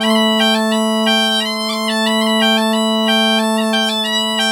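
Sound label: bagpipe 90, Celtic Flavours; USB Soundscan vol.42; AKAI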